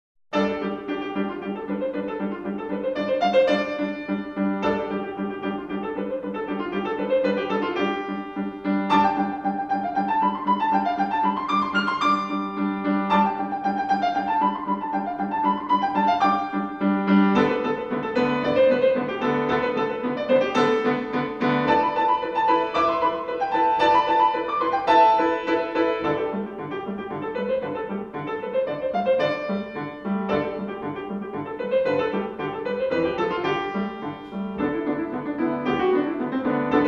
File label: Folk Dance; Mountain